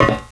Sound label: player metal; svencoop; AoMDC